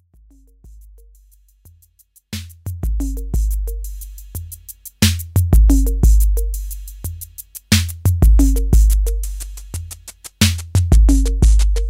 Thru Machine Dist hebt Level an

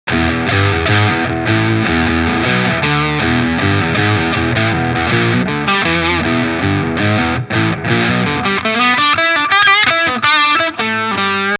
verzerrt okt oktave poly mode range

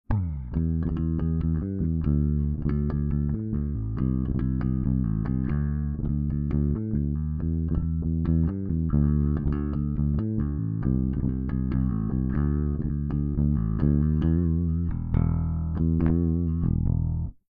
passiv Seriell Beide Pickups

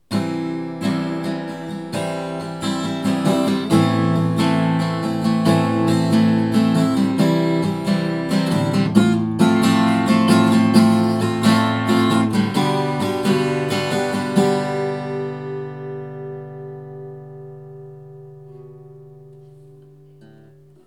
Gitarre XY

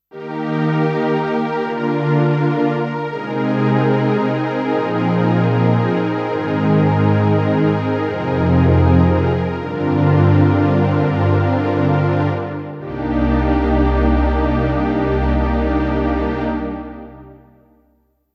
Korg D1 Streicher

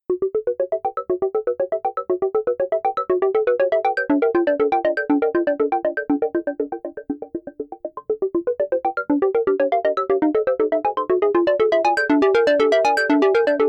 Sequenz; Blade

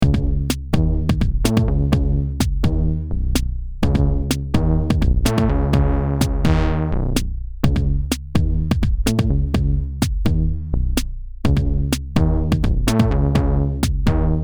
Drucktest ENV SAW Hallspirale

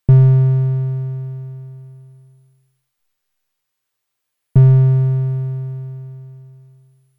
Erica Synths DB 01 Dreieck

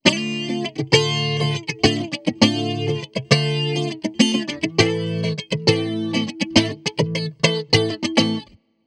Funky compressed Plattenspieler; Erres PS 106

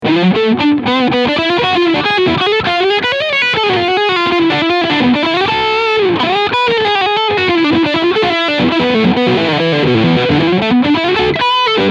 Leadsound Steg PU

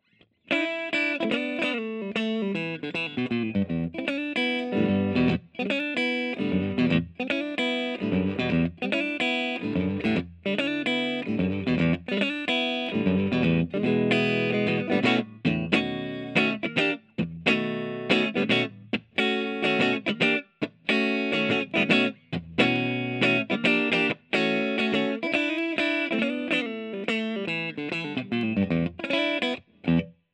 E Gitarre Clean ADA8200

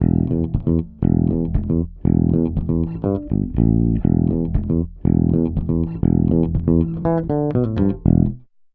Tubesessor Bass Kompression 80 Hz SC Filter; Heritage Audio

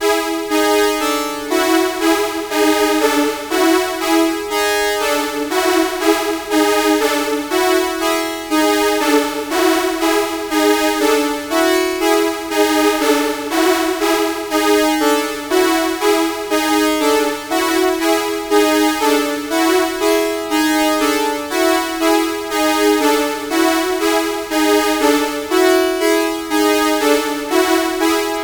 Polyphon Spread Stability CV; Demon Core

